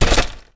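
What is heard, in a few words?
droid large fall Dirt; droids robots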